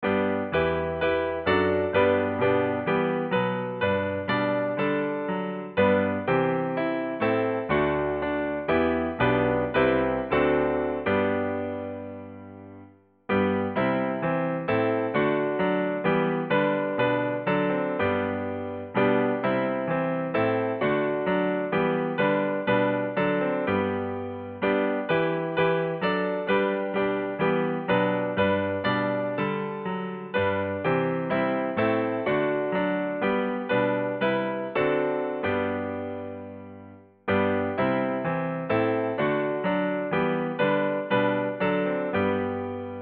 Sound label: SDA Hymnal Tune